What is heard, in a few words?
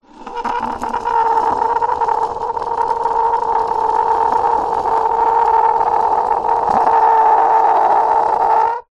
plastic object small slide scrape squeak; foley